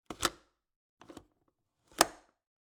Cabin, window, latch, flip, lock, open close, hyper; Eiravaein Works; Vaeyan IV